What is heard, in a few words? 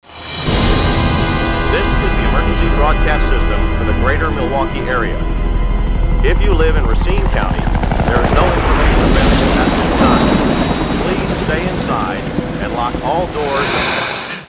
emergency